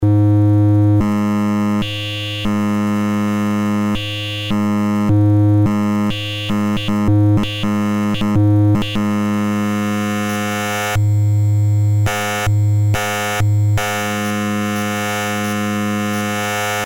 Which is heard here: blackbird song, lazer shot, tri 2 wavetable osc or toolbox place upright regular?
tri 2 wavetable osc